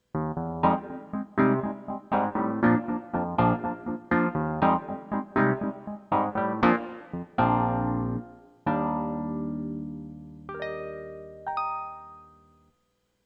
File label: EPiano